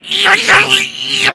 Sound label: rage at victim, infected, male